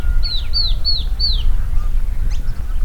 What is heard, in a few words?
Polioptila dumicola